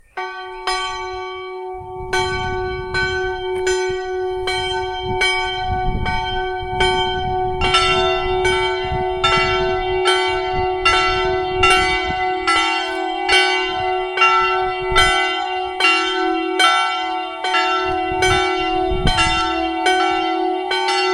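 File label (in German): Tonaufnahme e2 fis2, mehrstimmige Geläute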